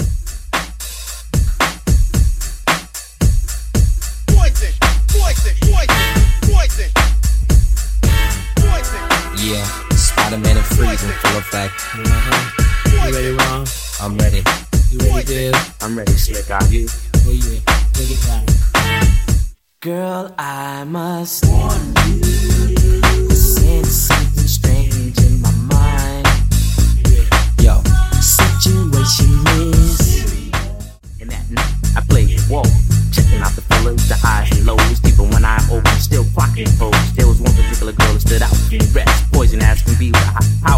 90s Redrum; Remixfreakz

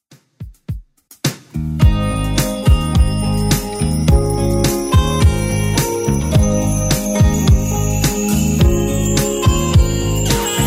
80s Redrum 106bpm

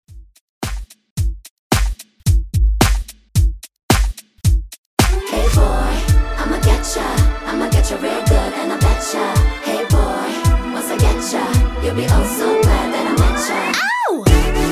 KPop

